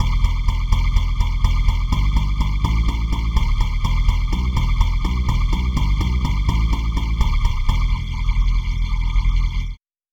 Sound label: calliope lead bass